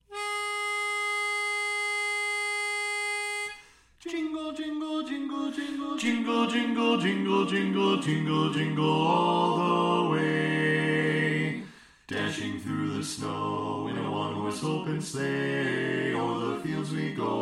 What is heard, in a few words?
Jingle Bells Bass Left; Christmas show